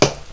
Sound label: player ladder